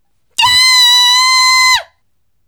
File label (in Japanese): voice himei